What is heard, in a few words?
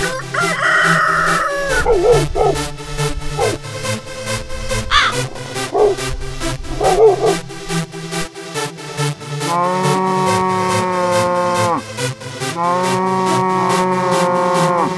Farmyard Dance Mix